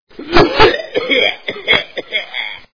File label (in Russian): kashel zhenskij silnij